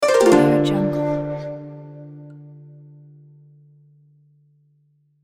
asian harp

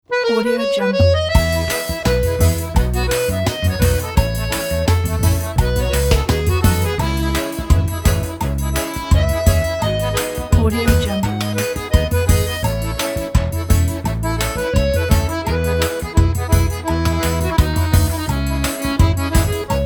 xote

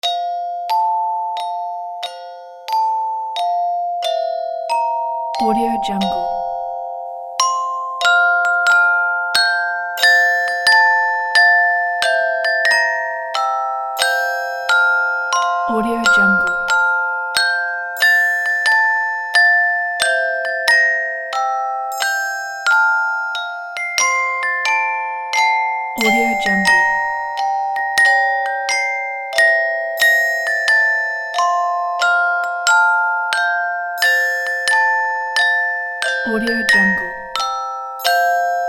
o christmas tree on glockenspiel

